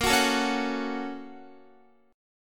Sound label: Bb Minor Major 7th Sharp 5th, Guitar; strummed; x,13,16,14,13,13; 4E,4A,5D,5G,5C,6F